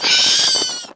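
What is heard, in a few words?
dead bat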